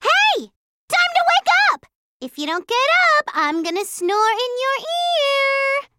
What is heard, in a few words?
Alarm; Neptunia